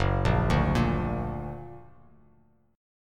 Gb Augmented, Bass Standard; Gb+ arpeggiated

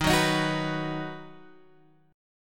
Eb Minor Sharp 5th Guitar Open G

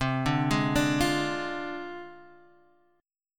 Ab 6th Flat 5th C

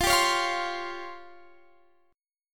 Db Major 7th Flat 5th, F, guitar

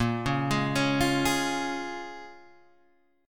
A sharp Minor 7th, A sharp, down, Guitar, Open G

